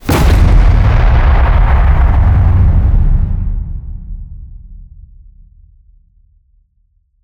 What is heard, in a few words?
HIT Distorted, Cinematic Hits Designed